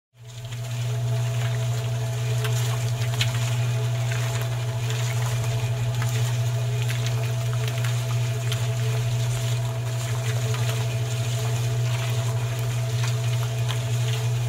Cement mixer

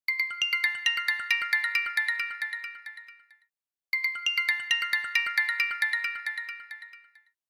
Apple Watch Ringtone

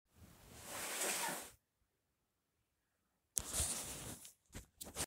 Cardboard box

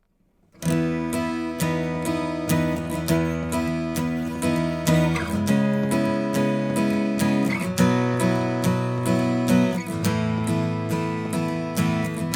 Yamaha guitar

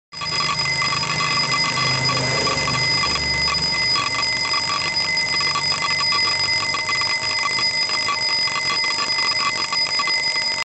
casio watch

